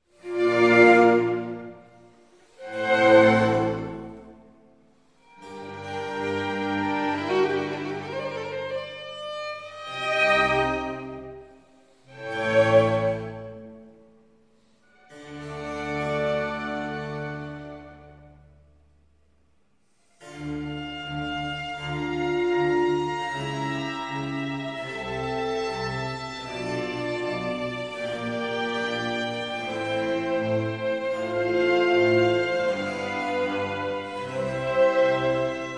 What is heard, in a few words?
Concerto for 4 violins cello strings & bc; in F major; Concerto No 7; Antonio Vivaldi (PD)